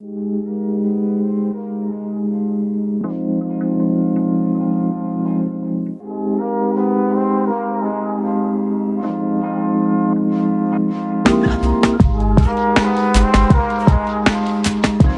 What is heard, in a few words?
Chirping Birds, lofi cafe; موسیقی بی کلام لوفای برای کافه و رستوران